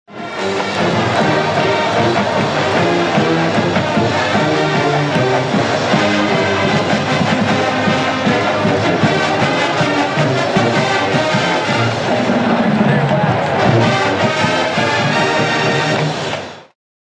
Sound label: College Football Game